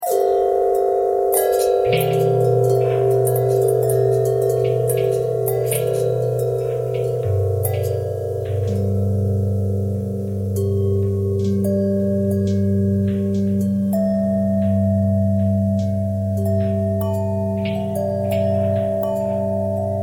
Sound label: long bell bell harmonic twist, bells